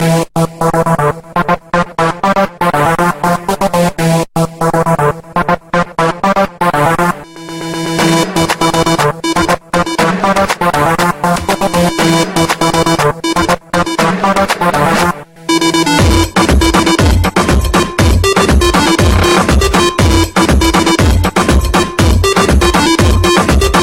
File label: noize bass disco